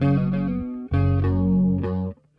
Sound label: guitar; Phonky